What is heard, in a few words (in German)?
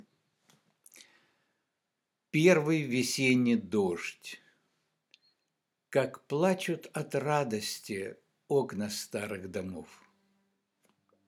Frühlingsregen